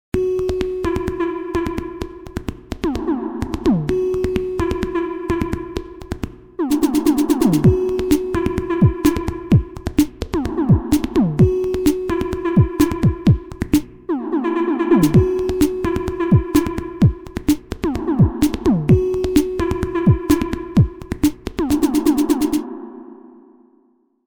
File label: Kick Sounds; KickLancet